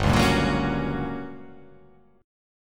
G Minor Major 7th Flat 5th G; chordshape; down

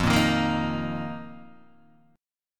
F 7th Suspended 4th F, Guitar, DADGAD

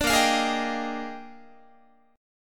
Bb 13th Bb Guitar, chord; strummed

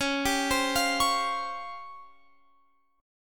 Db Major 7th Flat 5th, Guitar, Open F; DbM7b5; arpeggiated

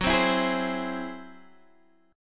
Ab Augmented 7th Gb, guitar

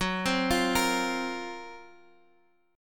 Gb Major 7th Flat 5th, down, Guitar, Standard; arpeggiated; JGuitar

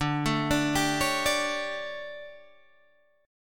D Major 7th Suspended 4th, Guitar, Open G

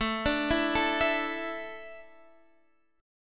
A Suspended 4th, Guitar, Standard; arpeggiated; capo 3; 17,17,14,14,17,x

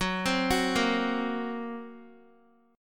F sharp Major Flat 5th F sharp Guitar; F#Mb5 arpeggiated; x,9,12,11,10,x

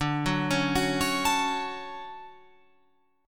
D Major 7th, Guitar, Open F; DM7 arpeggiated

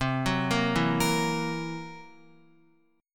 C 7th Flat 5th C down Guitar

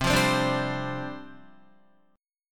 C Suspended 2nd Suspended 4th, down, Guitar; Csus2sus4 strummed

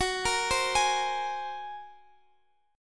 Bb 7th Suspended 2nd Sharp 5th Gb

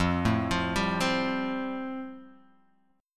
F Major Add 9th, Guitar Open D

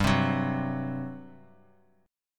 G Major 7th Suspended 4th, G, down

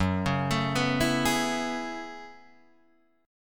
F sharp Minor 11th, Guitar, Drop D

